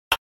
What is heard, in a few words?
click sound; jjs